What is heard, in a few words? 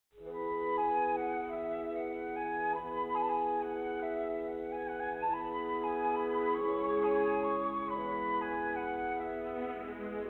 Music on Hold